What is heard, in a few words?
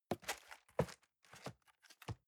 cardboard box open unfold; objekte